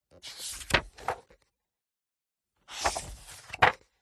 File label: turning magazine pages; Sound Ex Machina